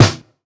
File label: tight snare drum sound g sharp key; snare drum samples